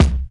old school hip hop kick drum one shot c key

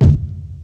reverb steel kick drum sound d key

